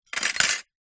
glass shards several from broken picture frame drop not break; foley